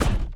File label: shoot Duo; Mindustry